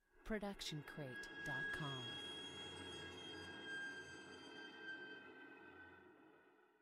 Cello Harmonics Long; SoundsCrate

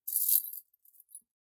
Chain Foley; SoundsCrate

